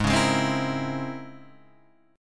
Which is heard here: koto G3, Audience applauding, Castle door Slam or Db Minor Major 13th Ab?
Db Minor Major 13th Ab